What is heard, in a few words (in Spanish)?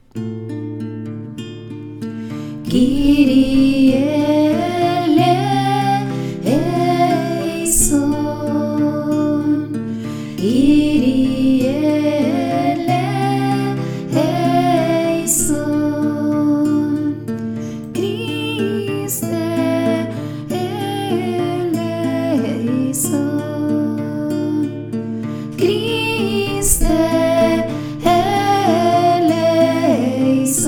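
KYRIE ELEISON GREGORIANO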